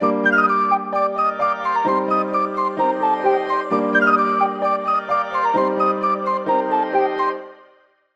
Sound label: Emotional Melody Loop - 130 BPM G Min, Hip Hop; Humble; Cymatics - Humble Hip Hop Sample Pack